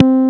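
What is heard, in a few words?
JV house bass, JV-1080; Syntec; Wall of Sounds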